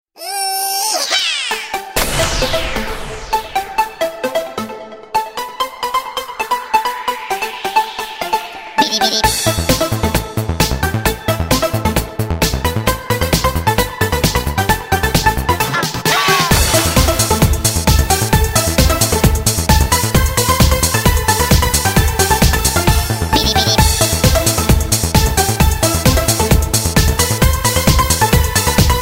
ring

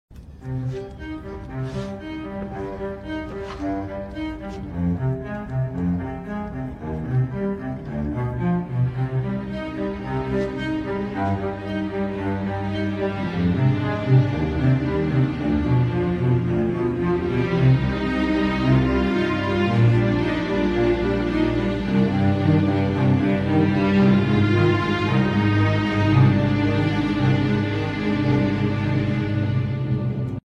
Beat

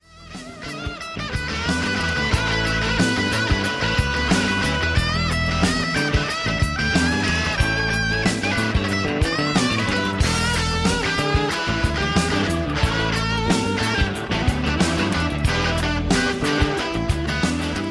Guitar

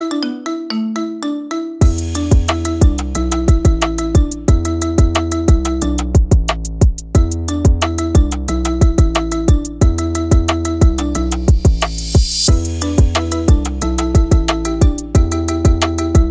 Marimba Remix

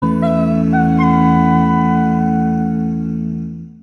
windows vista acapella

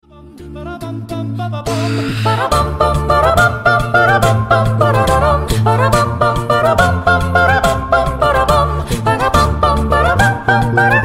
Acapella

